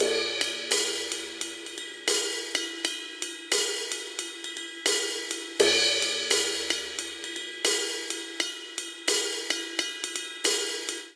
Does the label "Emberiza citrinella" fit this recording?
No